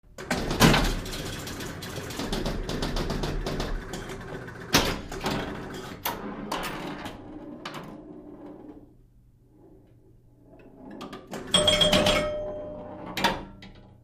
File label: Pinball Machine